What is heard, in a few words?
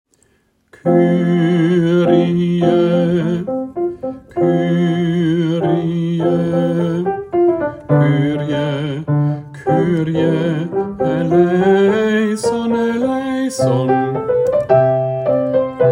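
Bass Kyrie, Übungsdateien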